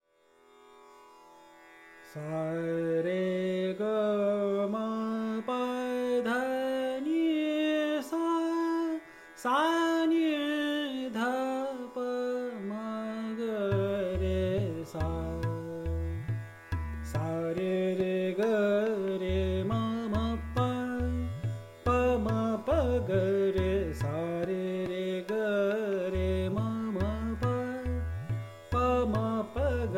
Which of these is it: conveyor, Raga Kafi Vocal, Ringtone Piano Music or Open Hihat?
Raga Kafi Vocal